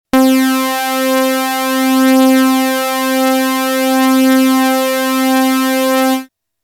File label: Triple Res Saw; synth patches Korg Prologue